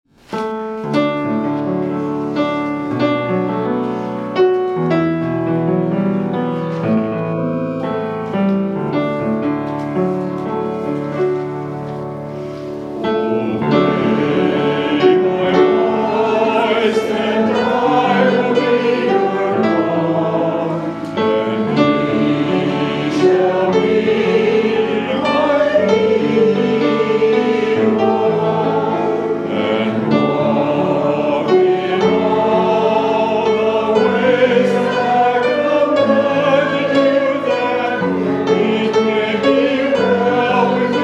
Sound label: Hymn; Obey My Voice